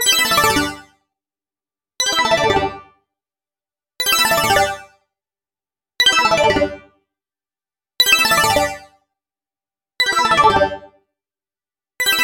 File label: Robot, alarms; LG Qua tab PZ LGT32